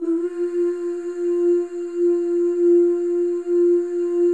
FEM OOS, Symphony of Voices; Spectrasonics; FEM.2 OOS

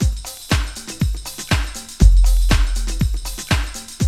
120 LO HO, loops; AMG; Now CD-ROM (Roland)

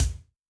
BD Rock, Yamaha DTXpress II Kick

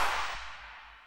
Tails, Drum Bits, Verb Tails

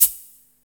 SHAK 2, Hip Hop R n B; USB Soundscan vol.19; AKAI

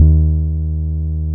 KEY RMI, RMI E.Piano; Roland LCDP10 Keys of the 60s and 70s 2

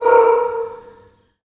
DOVECALL, Heart of Africa, BIRDMEN; Spectrasonics

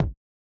Kick, 135bpm; Zero-G Sound Sense Grime Scene; GS Construction Kits; The Hoodrat

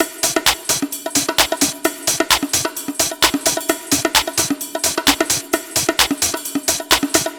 DRM, LOOPS 130, House

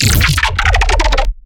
glitch stab; Virtual Riot; Glitch Stabs

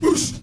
MALE WAR, SHOUT

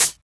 Closed HH; Vengeance; Essential Clubsounds Vol.4; Cymbals; Closed Hihats

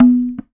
DHOLE, ETHNIC DRUMS; Spectrasonics; Liquid Grooves 1 & 2

